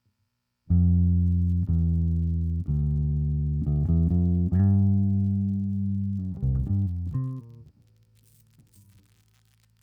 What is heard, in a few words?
bass